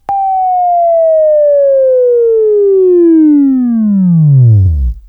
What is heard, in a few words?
WAV Left, Sweeping